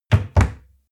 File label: cupboard door close